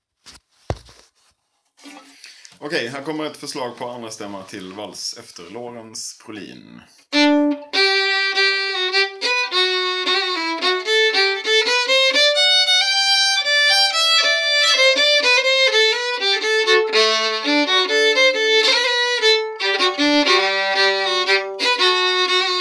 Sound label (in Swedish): brolin stämms